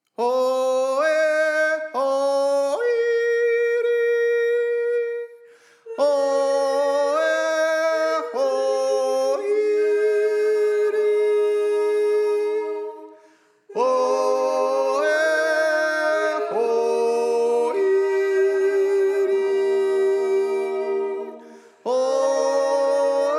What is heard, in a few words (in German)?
Steirer Dreier Tenor